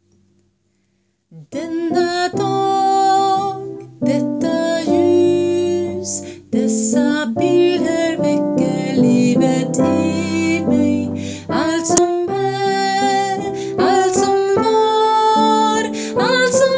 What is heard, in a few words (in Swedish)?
sopran; Ta emot; tacksagelse